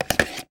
household telephone digital place into charging dock and miss; sound effects one